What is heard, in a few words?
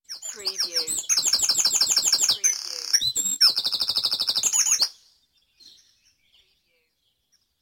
Dawn Chorus in Mold North Wales